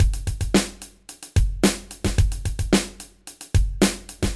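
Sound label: Groove Hip Hop; Groove Lab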